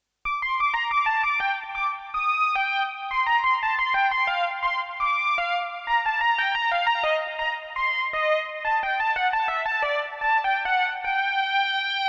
Bach trumpet

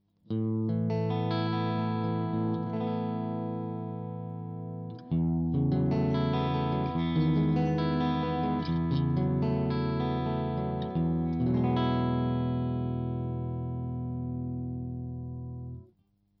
clean Position 3 KSM313 back

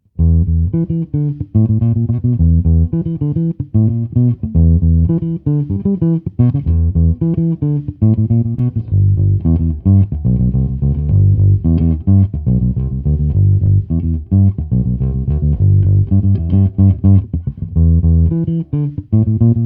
Preci Fingerfunk

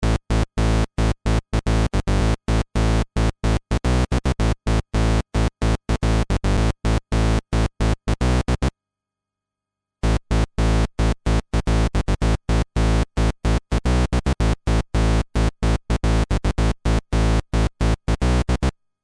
Waveform Saw Sub

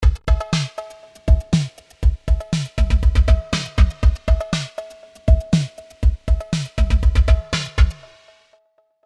CMI V Beats ROTARY CLS 222 Slow Wide Leslie

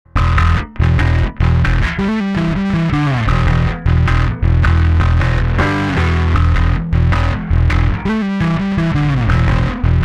Alpha Omega bassboost

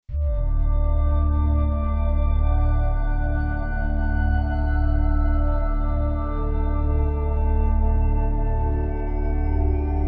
Pad; Elysion 2; Starfall

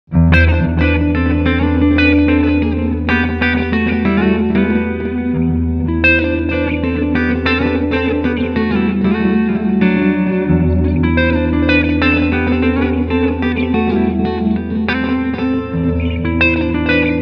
Tape Echo

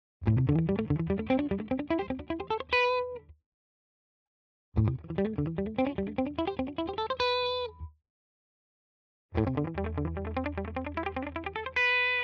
clean Penta muted all positions; Schecter Exotic Ziricote

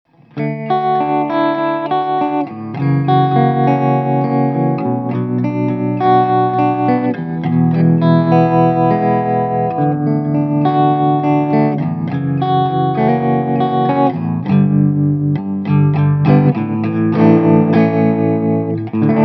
VOX Mini Super Beetle clean hall tremolo

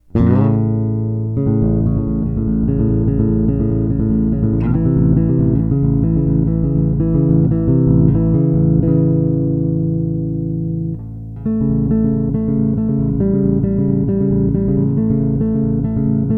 Chords bridge coil

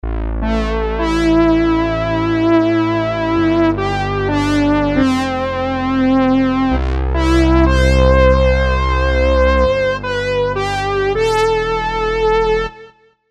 Brass Leads

